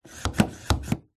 vehicles car door locked handle open attempt ext

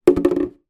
household watering can small down on hard surface

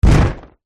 human body slam against surface

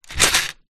leisure game pocket travel shake; mastermind; zapsplat

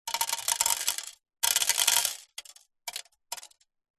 foley several small stones drop on metal

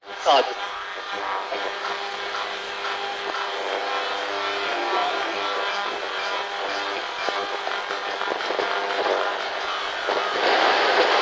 science fiction radio interference weird; zapsplat

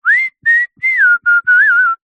human whistle mouth short song; zapsplat

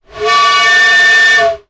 sound design effect air pipe pressure; sound effects one

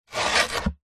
sport skateboard scrape deck pick up; zapsplat

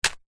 plastic bag water flick onto from hand; foley

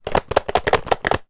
foley box card mobile phone packet shake; zapsplat

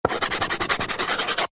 chalk stick thick scribble on small chalk board; office

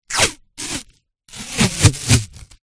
band aid plaster strip peel from roll

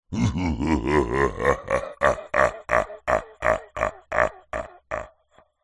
horror male low pitched evil laughter

